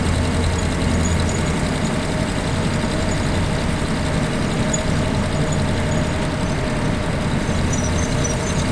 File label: m5a engine int rpm 33 load, tanks; engines; War Thunder